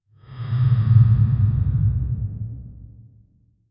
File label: deep breathing zombie, breathing